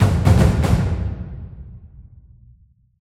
Jingle Loose; Boiling Bolt

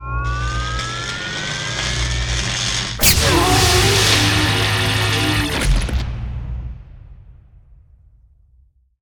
eyeball Holder Power Fire Tractor

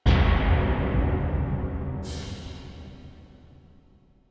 Tymp

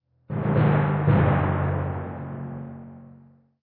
Tymp Hits

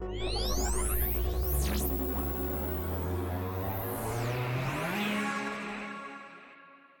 power up; Warden ash

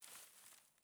grass move

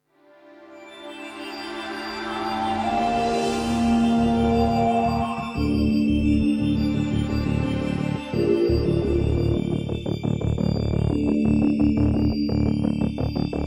Ingame Music Test

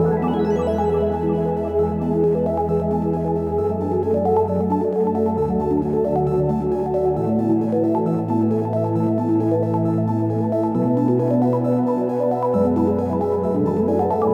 Music Menu; sayonara wild hearts 2019 gamerip; Konichiwa

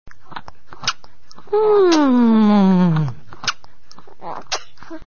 drinking; Oswald

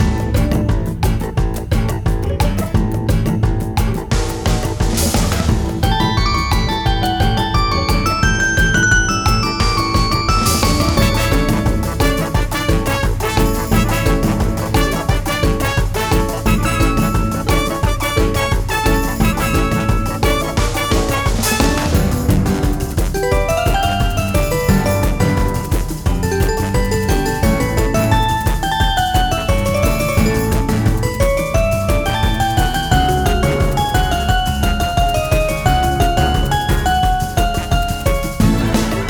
Monkey Jam Fast Loop; assault on metaltron ps4 switch windows xbox one gamerip 2019

